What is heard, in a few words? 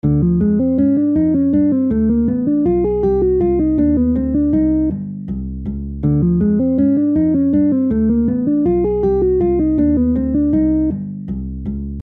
minor 2 5 1